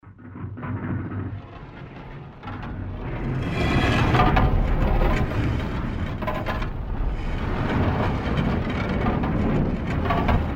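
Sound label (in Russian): destruction of a large stone structure; Звуки строительных работ обычно связаны с разрушениями, такими как снос зданий, разравнива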